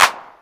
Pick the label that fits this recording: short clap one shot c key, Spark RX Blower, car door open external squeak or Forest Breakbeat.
short clap one shot c key